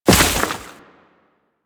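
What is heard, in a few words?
Crewman Step Vegetation, Footsteps; Warframe; Corpus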